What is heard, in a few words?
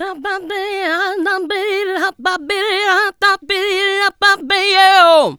HA BA BY, Funky Vocals, ADLIB WOM; USB Soundscan vol.18